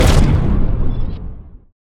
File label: artillery sap big; Mindustry